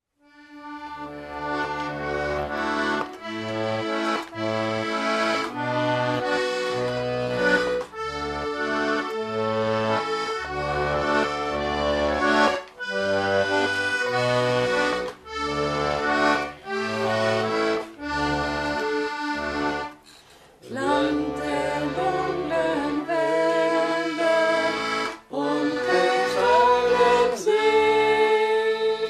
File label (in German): Gesang; Ostpreußenlied; Land der dunklen Wälder